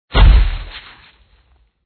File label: snowball launch; Raft Wars